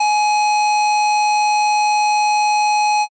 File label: ecg flat